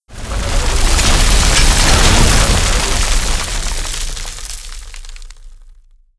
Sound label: debris, ambience; ambient, ambience, rainscapes, rain; Left 4 Dead 2